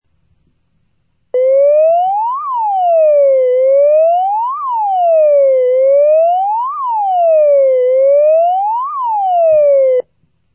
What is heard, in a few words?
siren